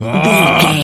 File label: voice male battle shout